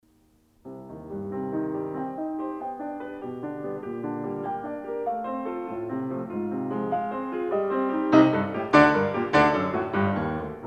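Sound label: Piano Sonata No. 13 in E flat major; Wilhelm Kempff; Beethoven; Piano Sonatas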